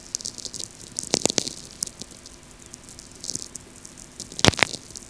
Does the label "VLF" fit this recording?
Yes